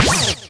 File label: record stop; HeavenStudioPlus; djSchool